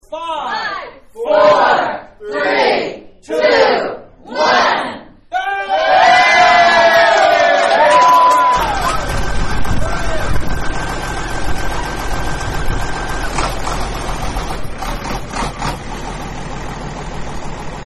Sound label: tractor sound